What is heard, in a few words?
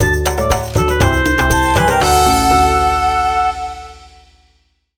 Minigame Lost; Naruto Shippuden: Ultimate Ninja Storm